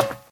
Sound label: metal step; Original TK Sounds